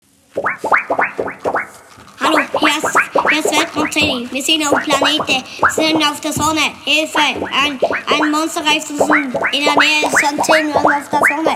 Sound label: VOCAL